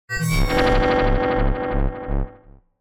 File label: interface action confirm long; exointerface